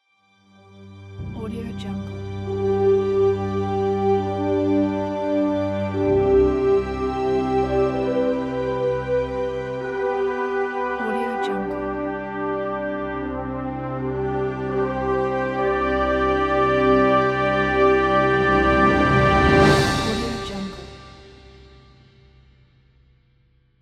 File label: heroic horns logo